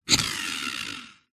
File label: leisure rc car wheel force spin by hand